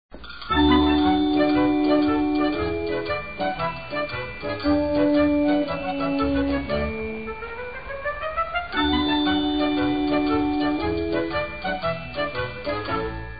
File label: bike ride